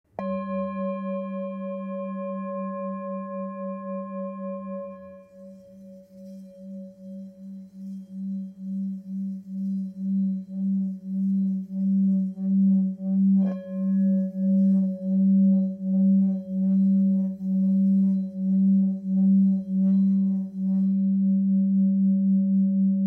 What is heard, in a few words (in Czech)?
tibetska misa